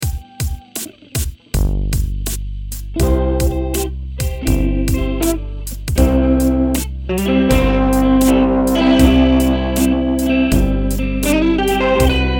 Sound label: A Sweet Melody